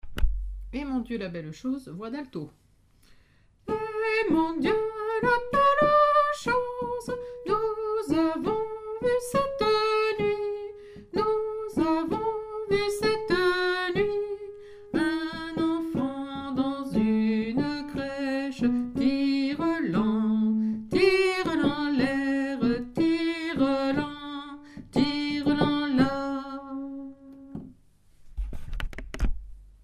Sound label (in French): alto; eh mon dieu la belle chose